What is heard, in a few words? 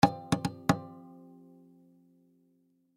Violin Tap String; Musical; Blastwave